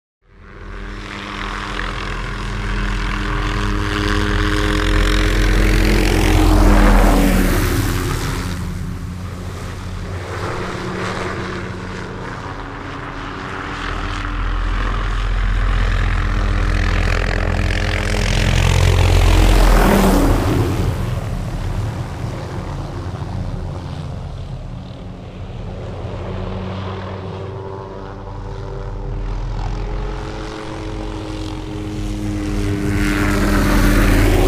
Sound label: The helicopter circles, flies by several times